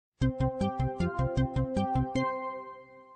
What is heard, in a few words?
board fill sound